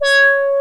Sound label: ANALOG COMBO; OMI - Universe of Sounds